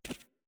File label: Walk; Antihero